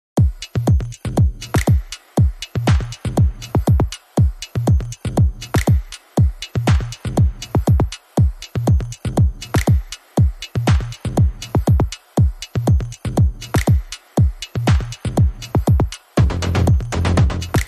Drums and Bass

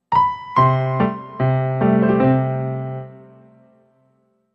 Doodle Mood, Sting 1

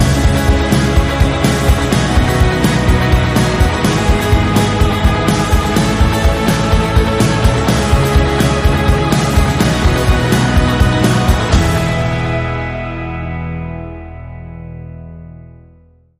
Bumper

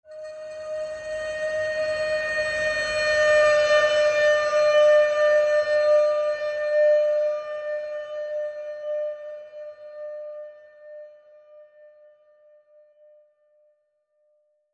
Glass Bend

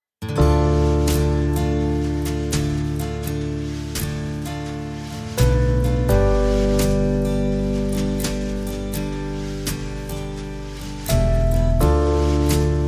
Monday Blues, No Vox

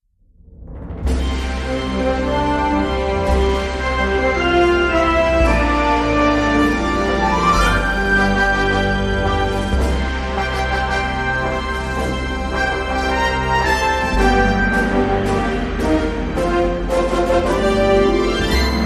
Fanfare

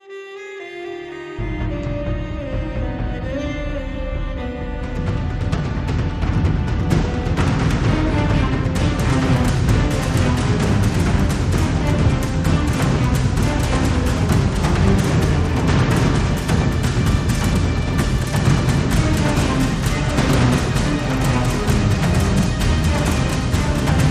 No Vocal

